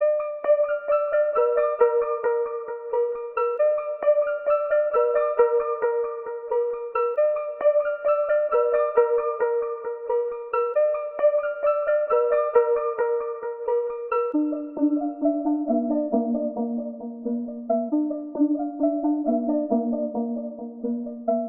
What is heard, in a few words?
134 BPM D Min Synth Plucks; Trap; Loop Stems; Cymatics; 2022 Melody Collection; Trap Pt 2; On Top